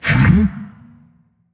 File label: notice